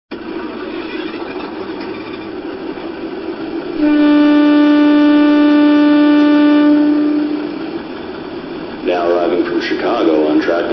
RDC BUDD

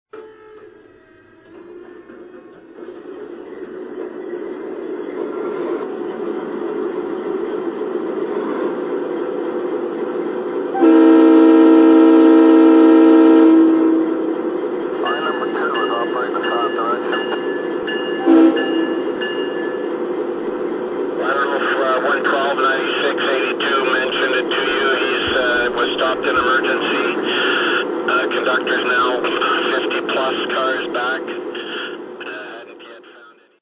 GE Dash9

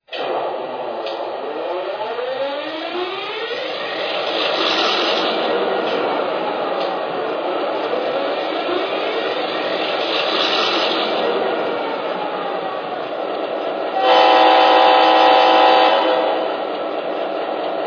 e8; wab

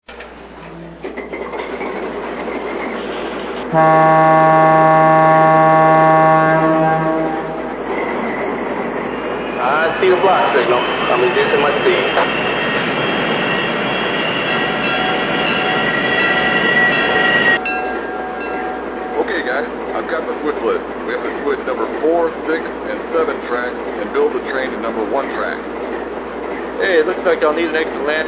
COAL TURBINE

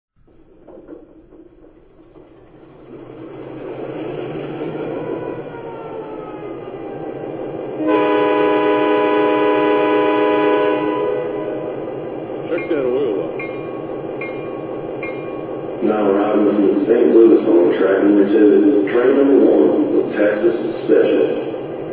E8 ABA

